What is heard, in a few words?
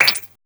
BUBBLEZ HI, CARTOON SCI; Spectrasonic Distorted Reality 1